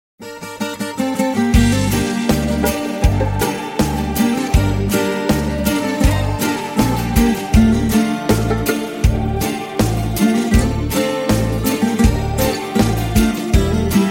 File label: instrumental